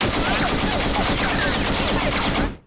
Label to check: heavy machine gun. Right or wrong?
Right